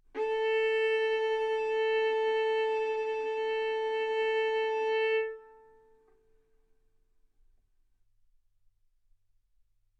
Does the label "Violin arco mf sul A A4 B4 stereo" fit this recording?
Yes